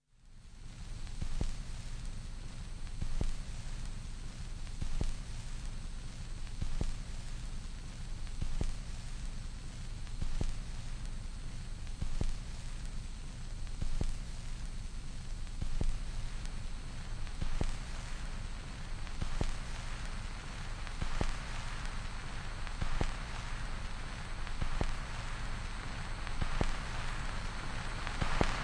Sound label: surf ace noise